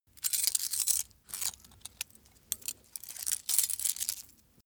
small metal handling; industrie wirtschaft; metall

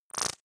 splutter short, zaps buzzes

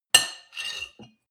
cutlery on plate scrape; soundpool

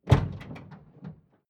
van door open

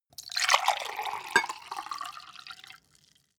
glass pour clank; soundpool

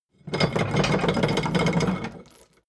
drawbridge winch hoist short; maschinen; metall; soundpool

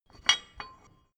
metal drawbar handling; industrie-wirtschaft

